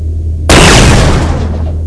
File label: railgun